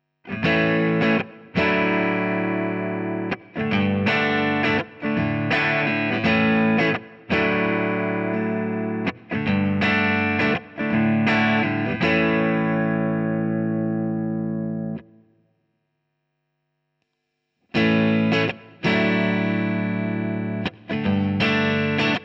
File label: JHS Prestige Princeton Enhancer